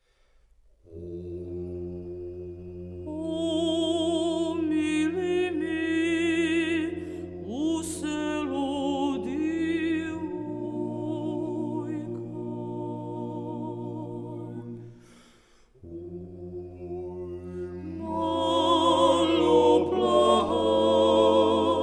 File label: acappella; Omili